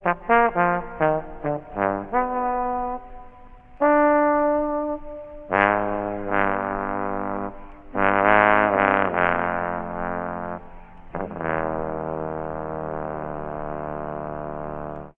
horns, Loops

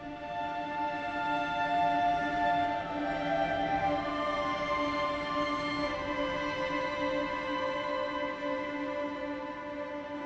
strings, Sad Loops

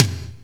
local, toms; Real

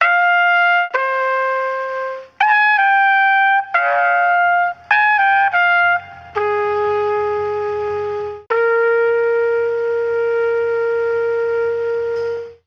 horns, phonky loops

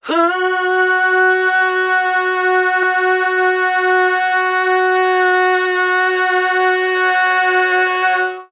hoo; Notes

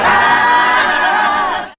choiry, Voice; Notes

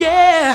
"yeah" sung

